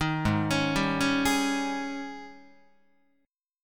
Ab Major 7th Suspended 4th Ab down Guitar 4A 4E 5A 5D 5G sharp 6C sharp; AbM7sus4 arpeggiated